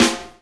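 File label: snare drum funk; E-Lab; X Static Goldmine 2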